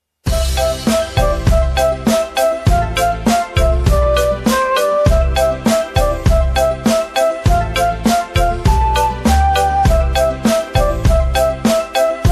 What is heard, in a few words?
Soundtrack; Game Pou; Jet Pou